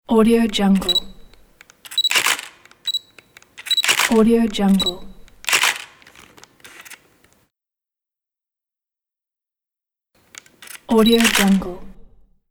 camera photo shutter sound pack

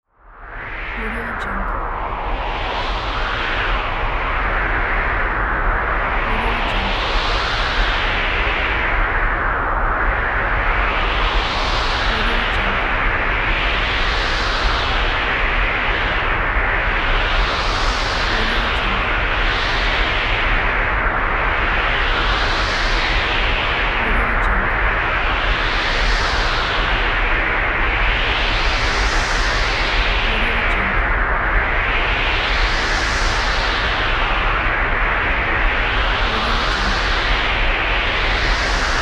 wind with subbass drone

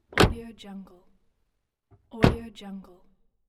closing the car door from the outside

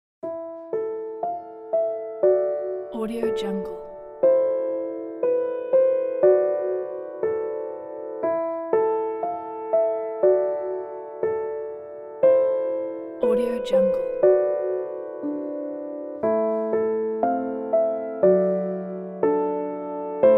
classic piano

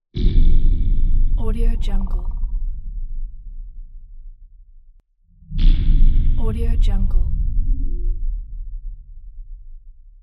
mystic impact

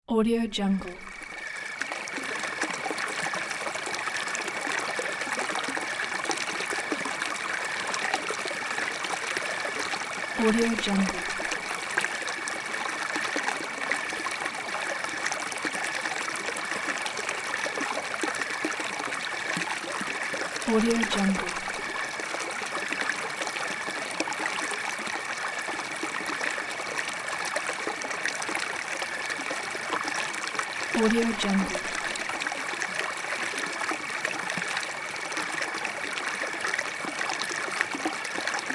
night brook flow

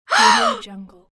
female gasping in fear

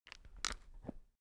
object case spectacles close soft; smartsoundfx

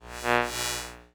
lost transmissions tonal passbys; soundmorph

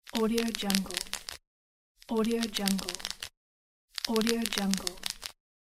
popping bubbles on a plastic bag

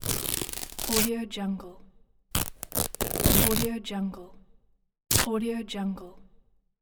cloth ripping

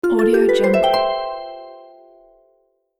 magical harp checkpoint success

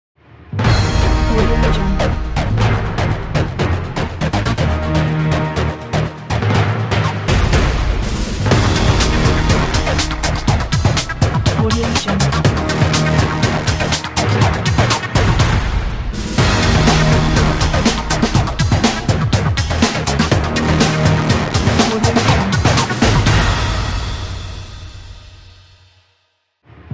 hip pop

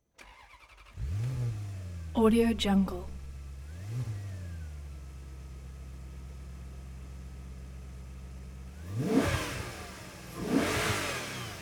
2004 maserati spyder car start engine revs; prosoundfx

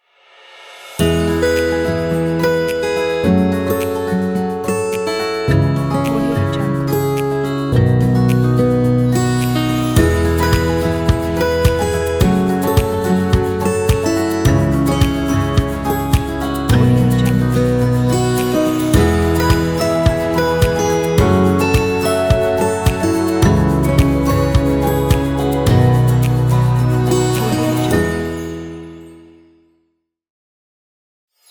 positive logo

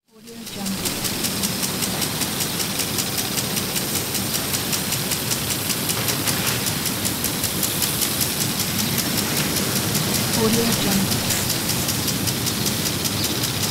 sprinkler

